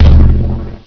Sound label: ATTACK; QUAKE 1; HKNIGHT